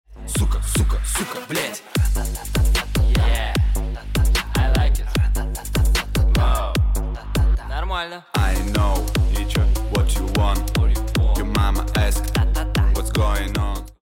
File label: choose team; music kits custom